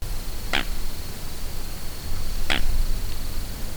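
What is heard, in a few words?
American coot honk; National Marsh Bird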